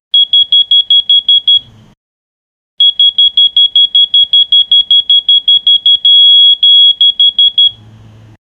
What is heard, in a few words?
mobile phone morning alar; alarm